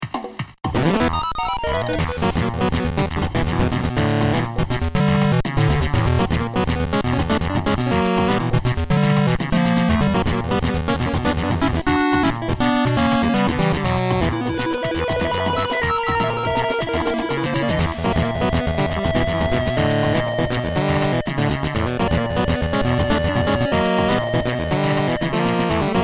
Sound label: Mini-Game Theme